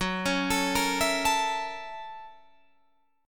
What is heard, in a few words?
F sharp 7th Sharp 9th, Guitar, Open D